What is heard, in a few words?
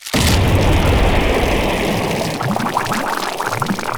rupture loop; weapons; dota; bloodseeker